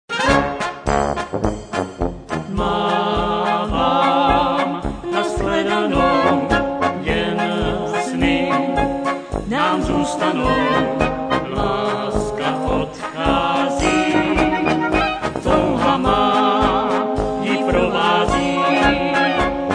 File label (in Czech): posvicenska polka